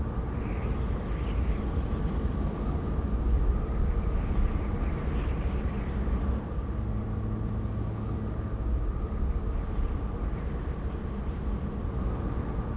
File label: wind, ambience; Star Wars Obi Wan; XBOX; coruscant